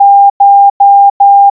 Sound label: wabun ko, morse signal